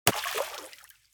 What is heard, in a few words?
splash small; smite; poseidon